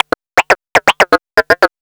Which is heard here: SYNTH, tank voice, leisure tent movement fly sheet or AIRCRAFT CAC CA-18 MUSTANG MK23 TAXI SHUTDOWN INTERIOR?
SYNTH